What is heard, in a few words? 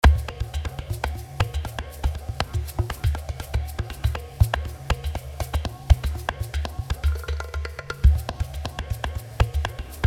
Euclid Urdu percussion